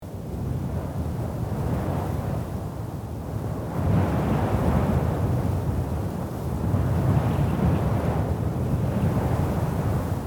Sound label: Desert sandstorm ambience